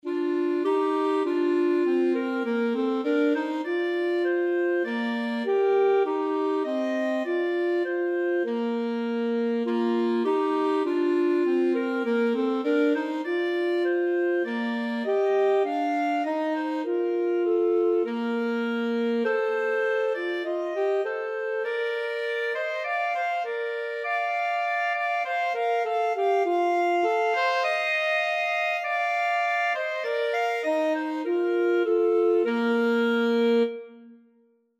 clarinet saxophone duet

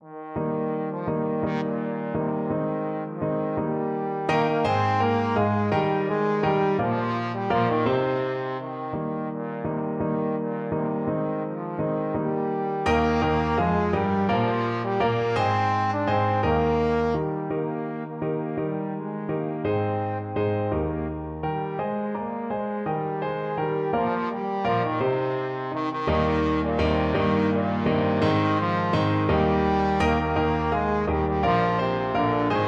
trombone

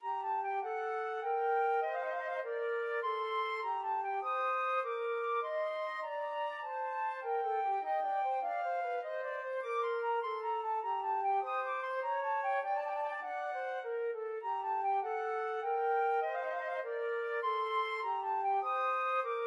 Minuet flute duet

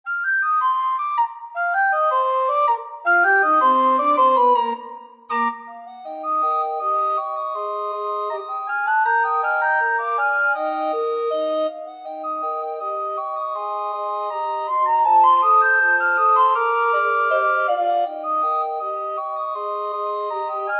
recorder ensemble

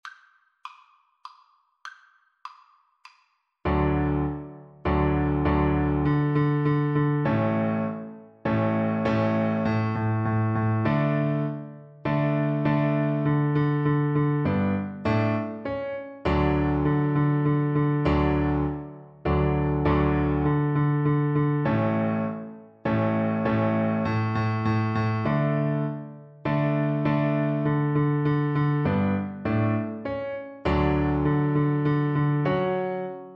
fanfare, Viola

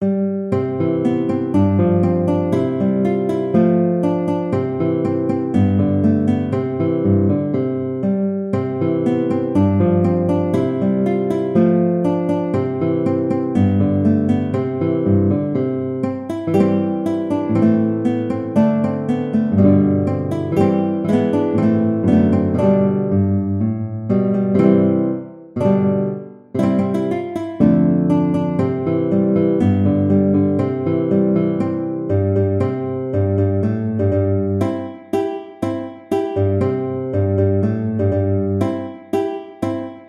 guitar

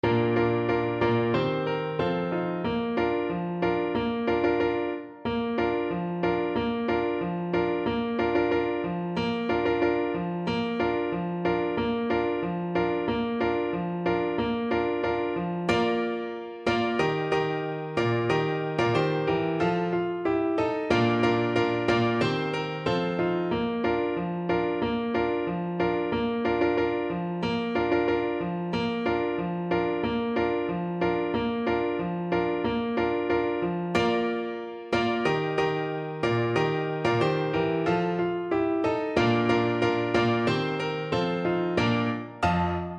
horn, French Horn

